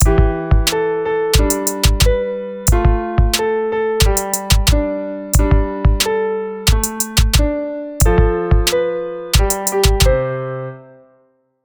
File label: song with beat